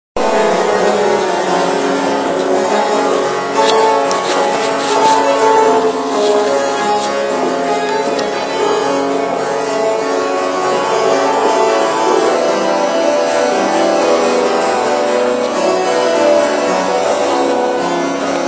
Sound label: harpsichord